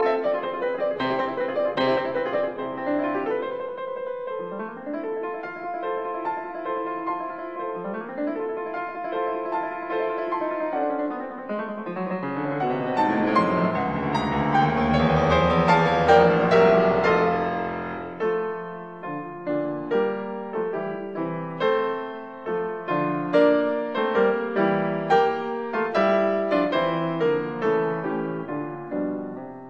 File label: Piano Sonata in B flat major, Allegro con brio; No 11; Op 22